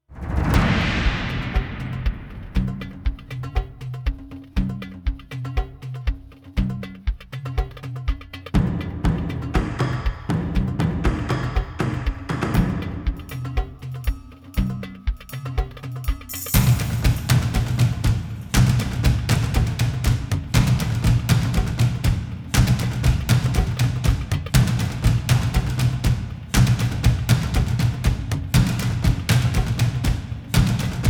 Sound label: Ghost Forest Combat